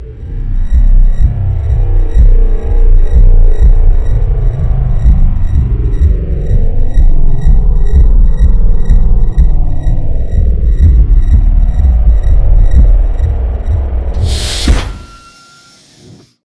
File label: futuristic space foley; robinhood76